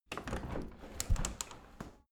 window handle open tilt soft; objekte; fenster

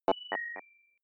notification message ring corrupted, signale

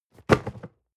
cardboard box medium fall; objekte

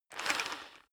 rock drill hammer rev short; bohrer; soundpool

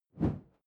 whoosh simple short airy low

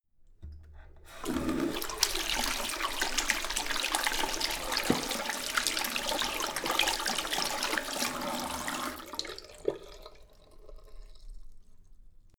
sink medium flow wash turn off on; haus garten; badezimmer

